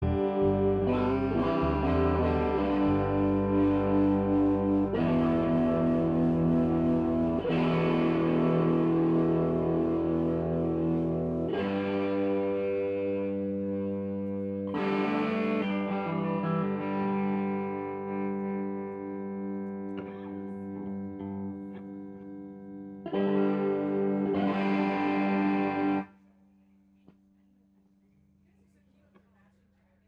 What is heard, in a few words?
tuning